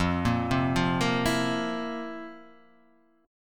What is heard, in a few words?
F Major 7th Suspended 4th; FM7sus4 arpeggiated; JGuitar